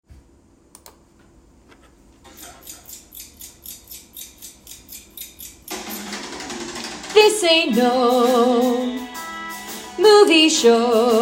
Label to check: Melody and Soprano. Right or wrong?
Right